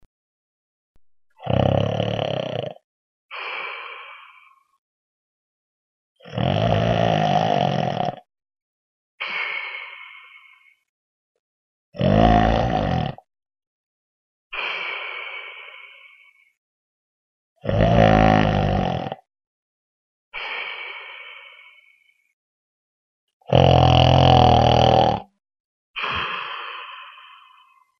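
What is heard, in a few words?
snoring sound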